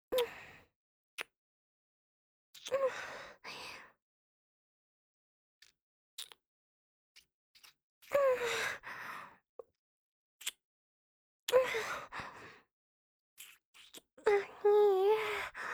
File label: kiss